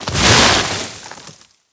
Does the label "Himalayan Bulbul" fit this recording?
No